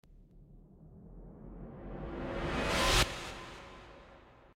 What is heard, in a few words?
System Alert; Terra Invicta